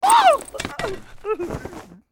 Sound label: Character White Defeated; Angry Birds Epic